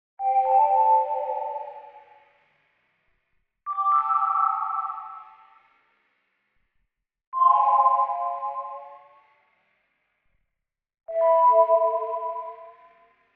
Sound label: Arcade Jingle Neutral